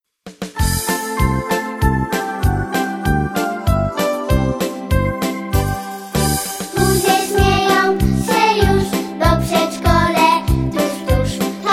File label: hymn